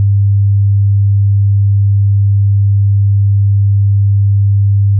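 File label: sine amplitude 0.05 frequency 10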